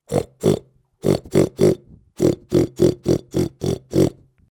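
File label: grunting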